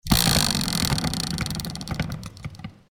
ルーレット; board game casino mahjong

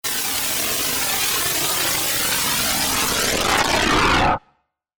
電気 バリバリ; 演出効果音

